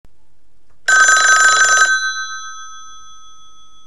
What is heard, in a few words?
American Phone, alarms